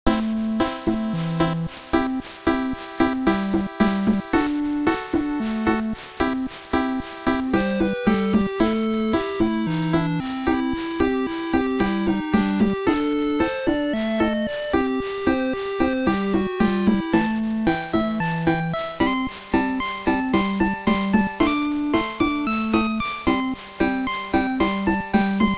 BGM Shop

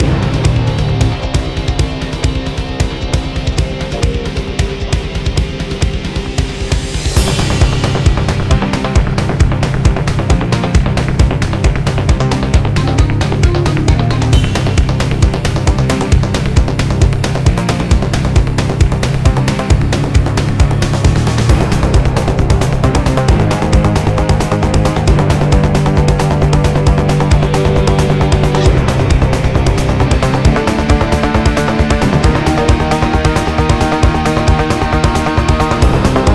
Corrupted; Voidigo

